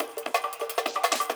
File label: 175 D&B Perc NoKick; Dance DJ; Percussion; Misc Perc